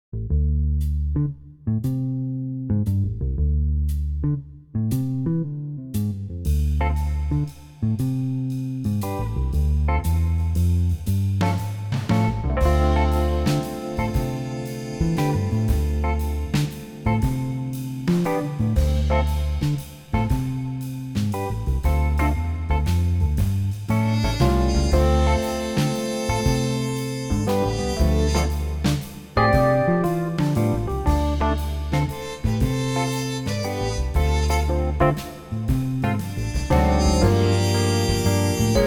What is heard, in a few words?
Alleycat Shuffle